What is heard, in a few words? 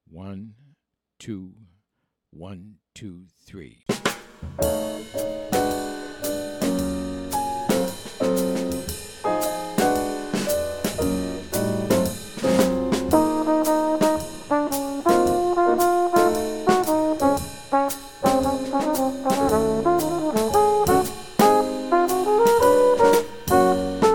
slow minus bass